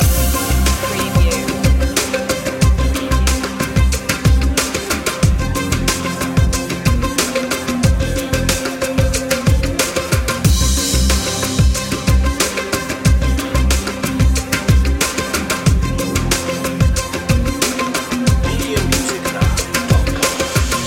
emotive presence loop

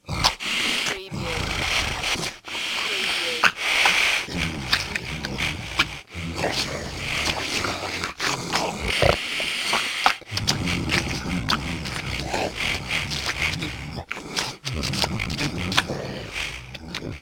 Monster grunting and feasting on flesh

